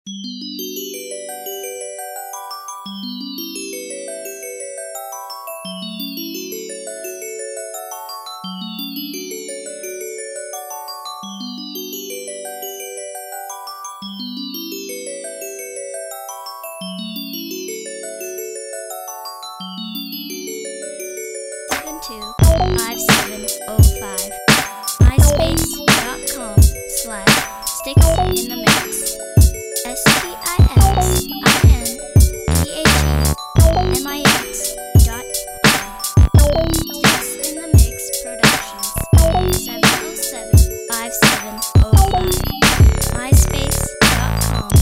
chime in

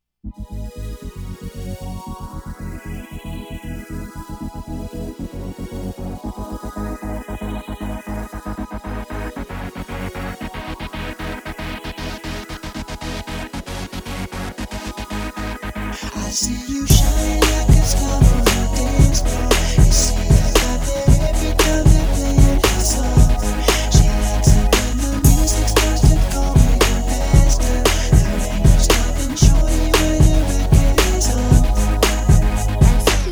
dance floor

